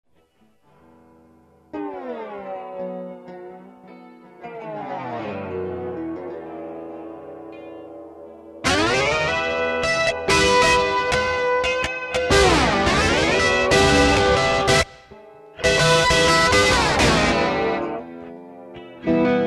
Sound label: Slide It, guitar